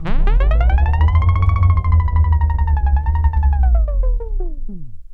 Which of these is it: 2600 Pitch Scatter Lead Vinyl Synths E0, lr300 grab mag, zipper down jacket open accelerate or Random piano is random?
2600 Pitch Scatter Lead Vinyl Synths E0